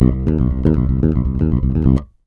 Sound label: RUMBLE, Ultra Freakin' Bass, 112 BPM; Sampleheads